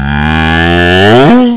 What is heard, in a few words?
SILLY COW; psion cd vol1